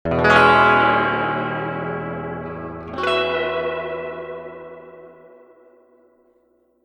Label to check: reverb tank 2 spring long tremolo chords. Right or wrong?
Right